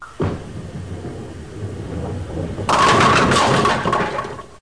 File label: bowling